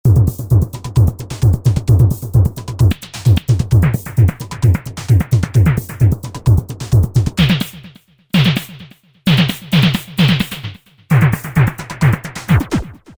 Tribe'n Beat, Korg MS2000; Factory Patches

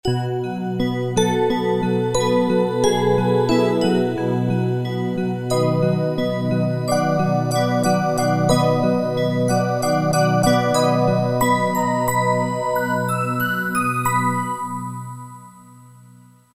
Warm Bell, Roland MT-32

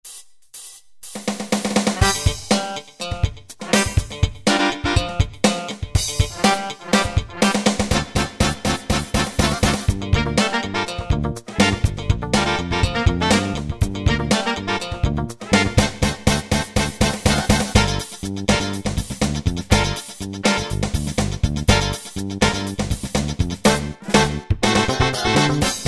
Funk, Yamaha QY70; Preset Styles

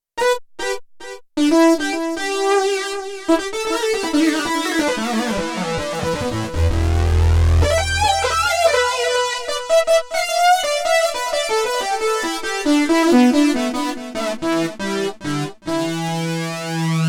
Lead Synth, Roland D-70; Factory Performances

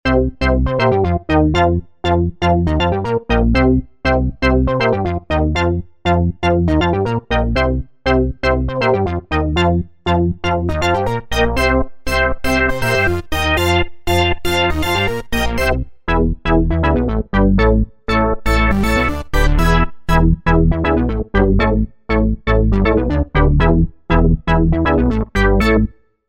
Roland V-Synth; Factory Patches; 136 Juniverse

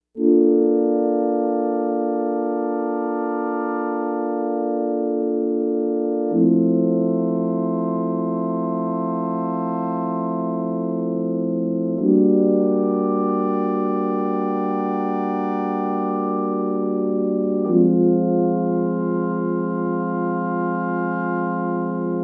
Resonant

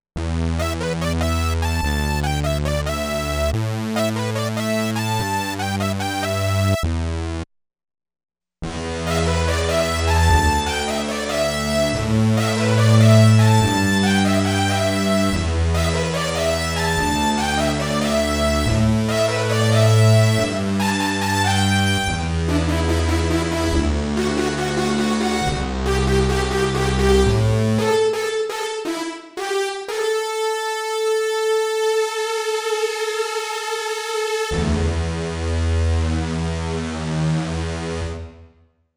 LIVE ROOM

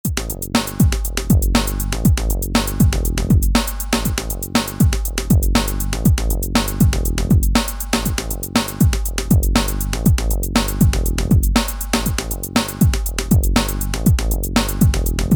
Rap Hip Hop

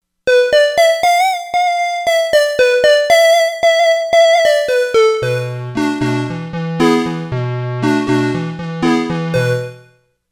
WarmLead

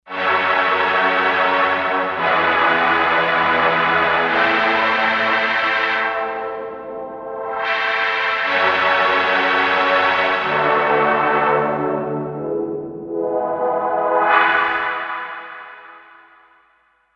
Stucco, Kawai K5000S factory presets

